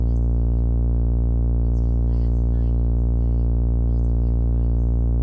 loud hum buzz audio

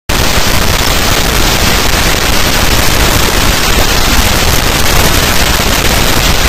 screeching static audio problem

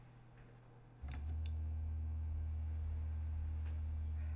motor noise hum; letus

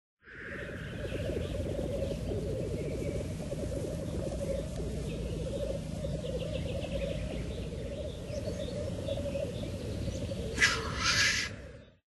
black grouse lek

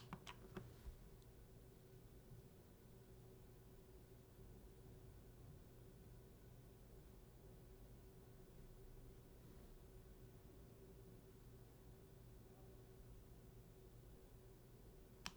interference, mono

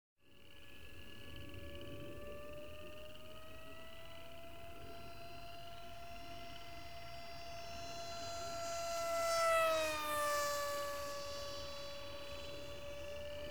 testing dr-05 built mics flybys; tascam dr05 built mics; skyfun model

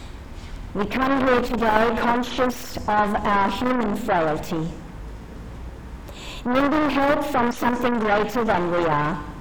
gross overload nodenoise

